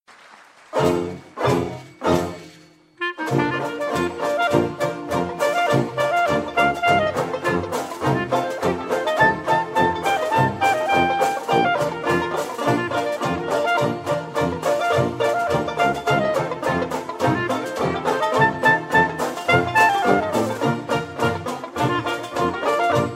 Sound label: clarinet